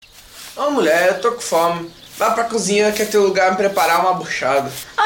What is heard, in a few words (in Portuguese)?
amor sertanejo